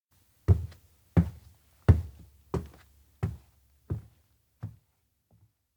steps indoor janitor room leave; anemoi パッケージ版 1.1

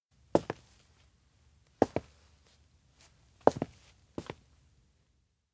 steps indoor walk few; awayuki; anemoi パッケージ版 1.1

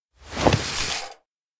mount bike; koyomi; anemoi パッケージ版

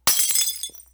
glass break; anemoi

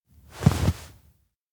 shoulder bump; awayuki; anemoi

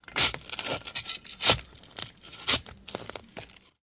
shovel; anemoi

